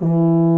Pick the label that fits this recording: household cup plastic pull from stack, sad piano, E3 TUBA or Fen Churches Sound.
E3 TUBA